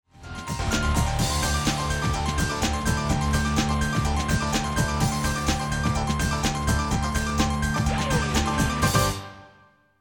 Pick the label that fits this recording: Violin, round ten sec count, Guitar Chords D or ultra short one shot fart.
round ten sec count